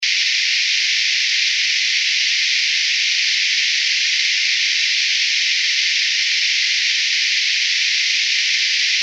White Noise

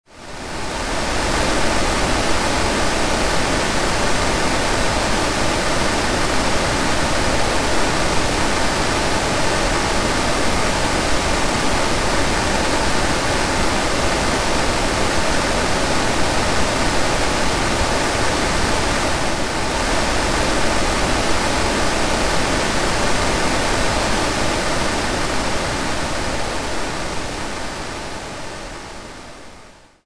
sound oasis white noise full spectrum

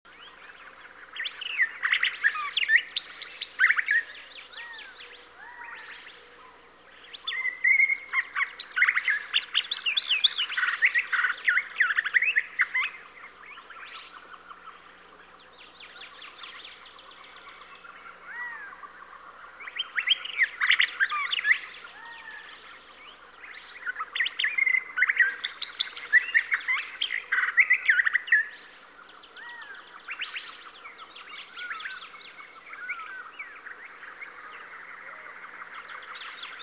WOODLANDS

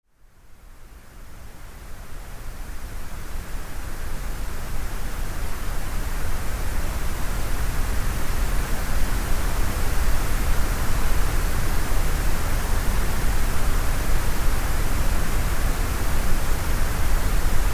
soft pink noise; sound oasis

